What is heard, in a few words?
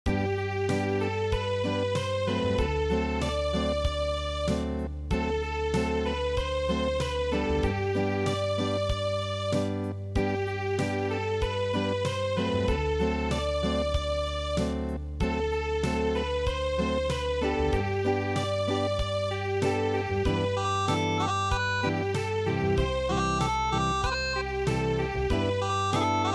Acoustic, midi traditional